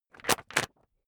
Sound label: magazine skim bright